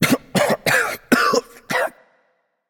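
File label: nonverbal clear throat; voicepack; smite; merlin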